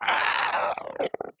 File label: death, strider; mob; minecraft